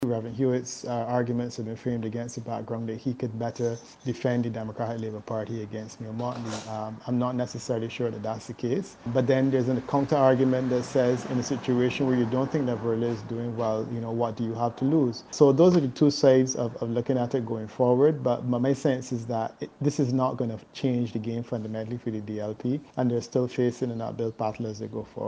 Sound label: beat